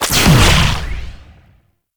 Amp Charged Shot; Warframe; Weapons; Operators; Amplifiers; Op Drifter Amp

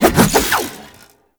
Staff Spin Swing; Warframe; Duviri; Dax; Weapons

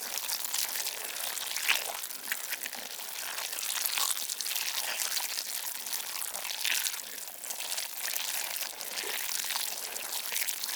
Gore Loop Fleshy Fast; Warframe; Infested Eris

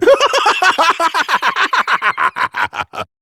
Laugh; Warframe; Tyl Regor Boss; Efforts

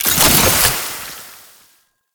Alchemy Grenade Pickup Toxin; Entrati

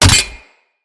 Grapple Hit; Weapons

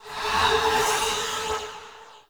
Generic Foley; Warframe; Choir

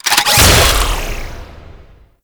Trapper Drone Fire Start; Warframe; Tau; Prime Grineer

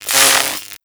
Lightning Rifle Spark; Warframe; Corpus

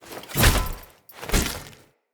Sphere Polearm Finisher

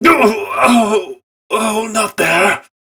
Training Dummy Pain; Warframe; Duviri; Target Dummy

